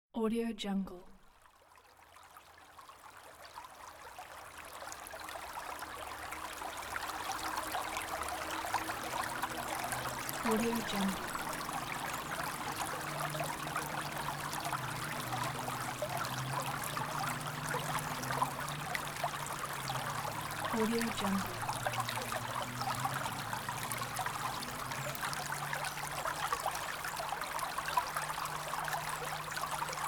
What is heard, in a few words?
birds on the river in spring